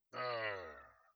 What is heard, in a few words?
death